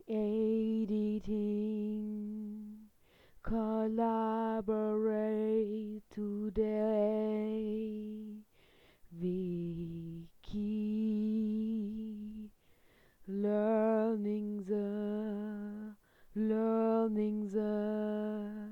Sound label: Alto